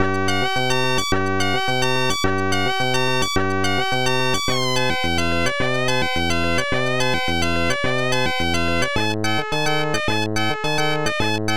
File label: bossa